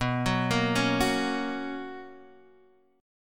B Major 7th Suspended 2nd B down Guitar Half Step Down